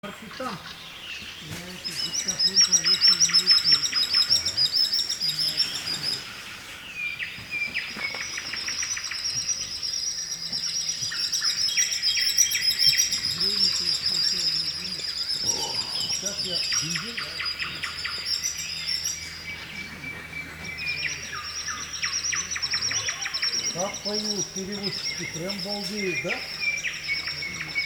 Sandpiper; آبچلیک

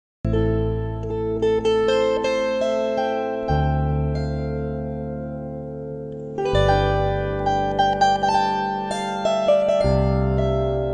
Kobza; کوبزا

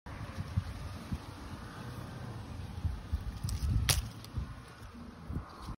Picking fruit from tree; چیدن میوه از درخت

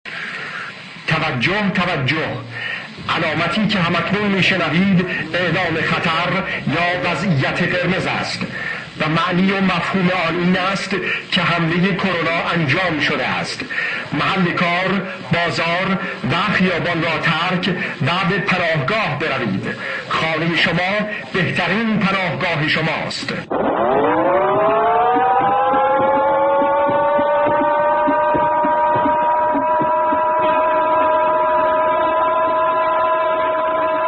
red alarm siren

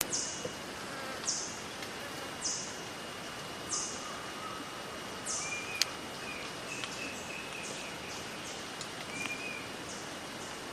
yellow backed tanager

